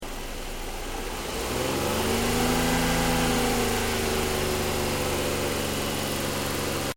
CAR NISSAN 350Z ENGINE BAY 1000 TO 3000RPM, manual sportscar; Cars; Vehicles; SoundEffects-Foundation1